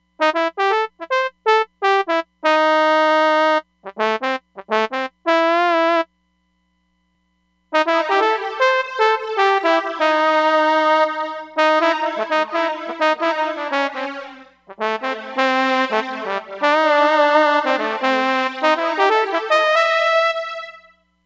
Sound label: Harmonized Large Room, Roland DEP-5; Factory Patches